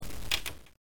tick

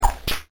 whip crack

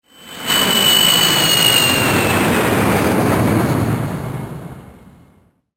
JET

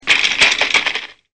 BIKE BOUN

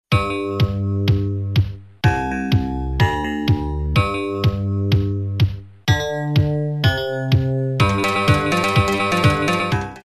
SKID

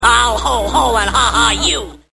ho ho ha ha

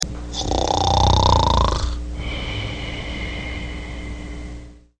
snore

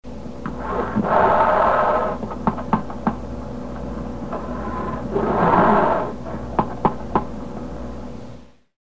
Black Bear Chomping and Snorting

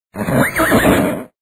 horse

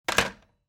phone hang up

